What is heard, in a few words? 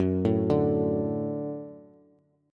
Gb Minor Sharp 5th, Bass; arpeggiated; x,9,7,7; JGuitar